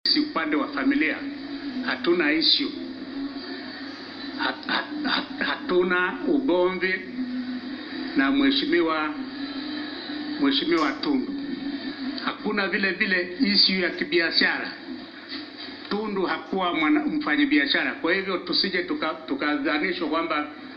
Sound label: lute